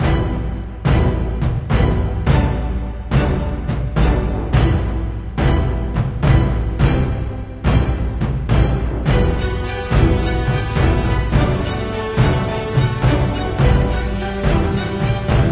Battle Normal; Summon Dragons 2; Android

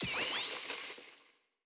Flying Get Score

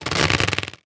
pull, water balloon

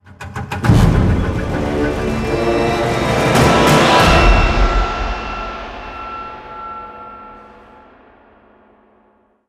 Combat Intro

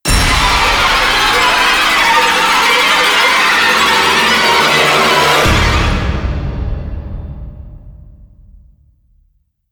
String Large

